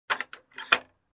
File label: Closing